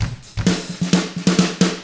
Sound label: HARD ROCK, Drums